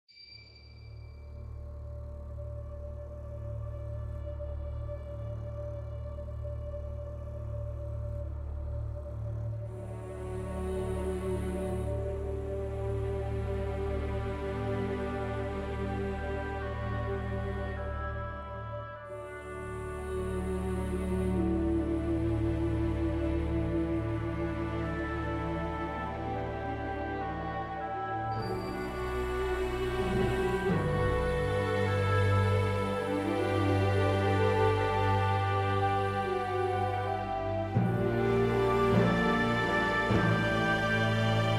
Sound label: Epic Orchestral Cover